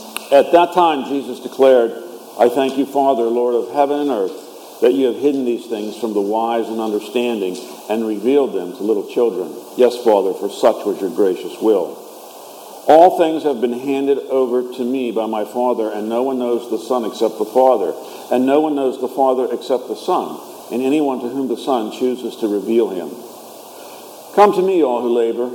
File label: Gospel